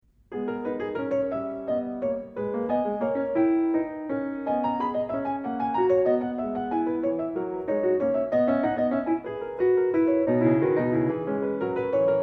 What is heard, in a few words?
Prelude and Fugue No 18 in G-sharp minor, Clavier; Johann Sebastian Bach; Well-Tempered Clavier